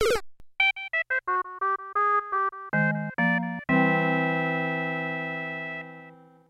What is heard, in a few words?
Builder Mario death music; SMM